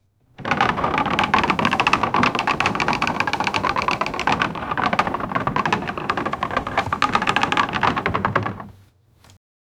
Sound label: wooden drawer shaking fro; object movement